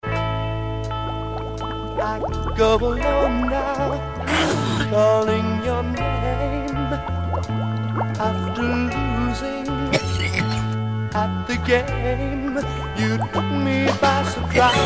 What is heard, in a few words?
Laughing